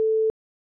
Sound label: call waiting tone ansi; iOS 26 Sounds